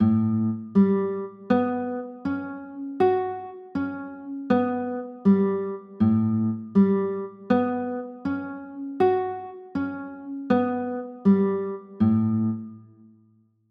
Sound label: a 6, chords